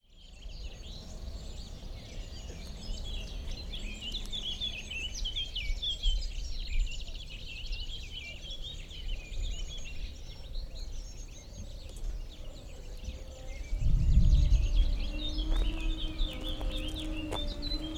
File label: Canal, Soundscape